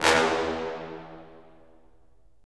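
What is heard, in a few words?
brass cimb, Roland; Roland LA Composer vol. 4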